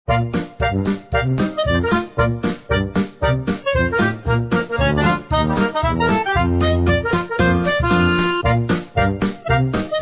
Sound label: BGM Main Menu; Shop Empire 2